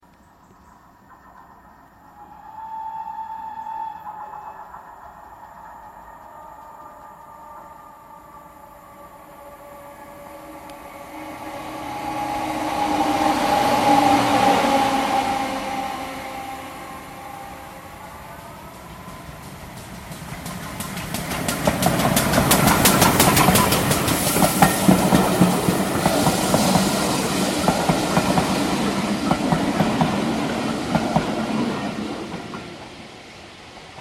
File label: soundscape train; time machine